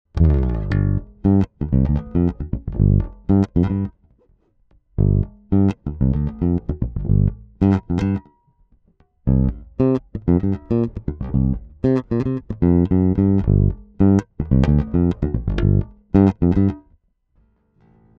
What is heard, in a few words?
active neutral finger